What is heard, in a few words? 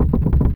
bike bridge; Golden Crayon Sounds